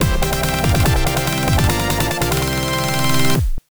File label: Bonus Fanfare; surprise attack; arcade; Bonus Fanfare 1 (10 Correct Answers)